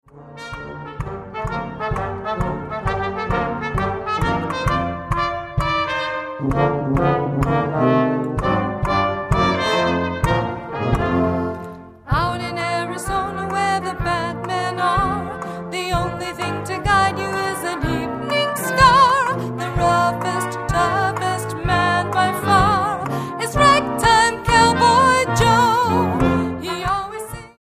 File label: ragtime